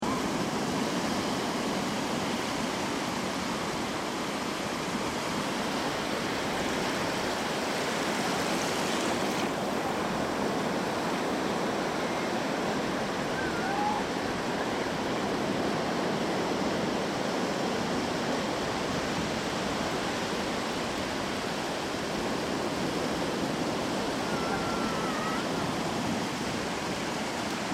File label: Ocean Beach Sound Of The Sea With People In The Background; Crafted Signals